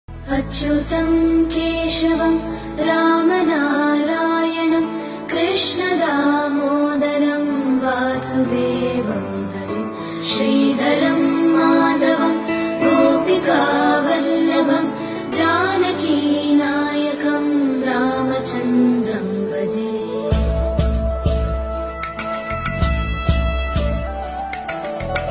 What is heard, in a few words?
hindu devotional ringtones